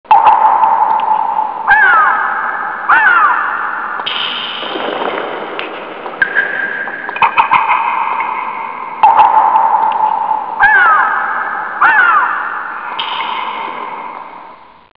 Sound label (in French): grotte